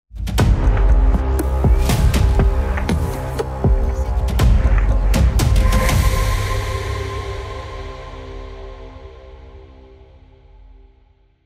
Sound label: Cinematic Logo